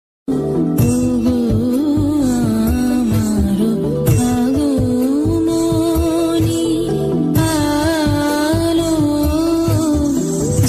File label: bengali ringtone; durga puja status